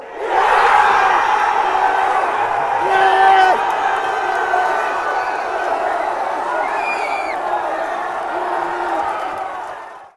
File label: goal; ncafc